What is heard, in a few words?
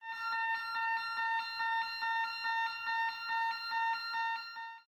nz txt alert; active911